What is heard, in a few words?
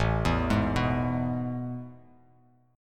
A Major 7th Suspended 2nd, Bass Standard; AM7sus2 arpeggiated; JGuitar